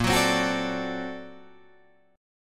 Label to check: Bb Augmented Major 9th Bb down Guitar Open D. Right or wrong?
Right